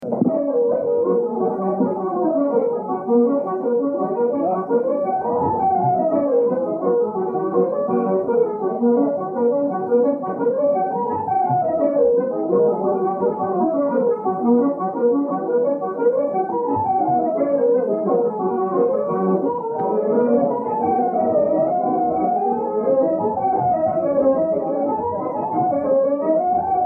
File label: hornpipe; Golden Eagle hornpipe